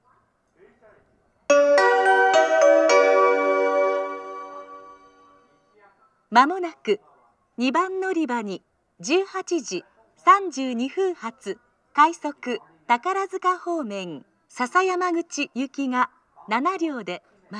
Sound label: rapid, jr-west amagasaki station; sasayamaguchi